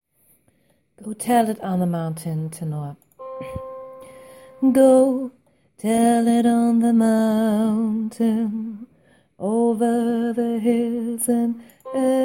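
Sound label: Go tell it on the mountain tenor, gospel choir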